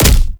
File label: Punch; Fulmin Prime; Weapons